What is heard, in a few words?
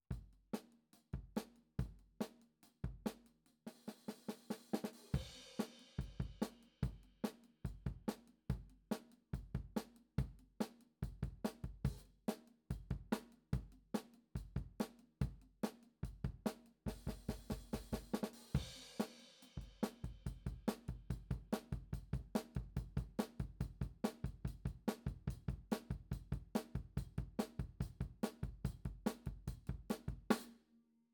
KIT